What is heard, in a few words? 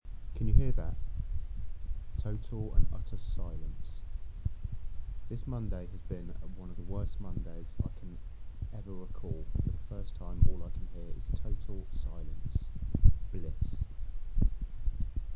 total silence